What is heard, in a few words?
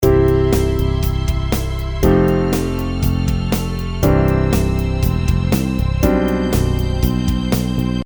Suspend a Third